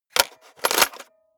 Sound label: cassette into cassette player hard